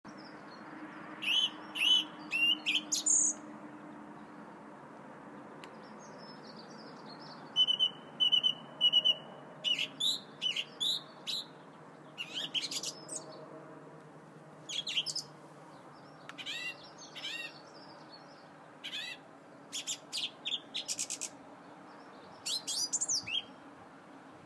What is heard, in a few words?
song thrush outside my office this morning